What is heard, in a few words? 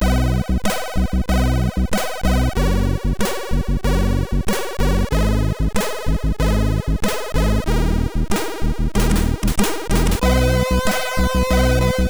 Game Song, sid MOS6581R4